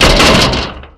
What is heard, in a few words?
metal; ambient